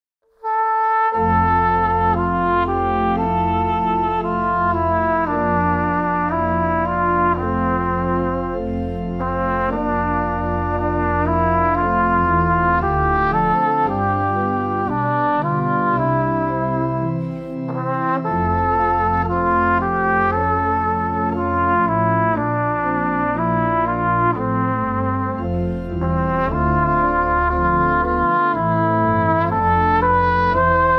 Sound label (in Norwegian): fiolin